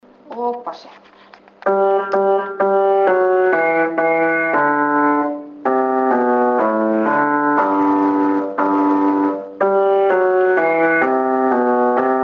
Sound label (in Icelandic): bassi; Bjart er yfir Betlehem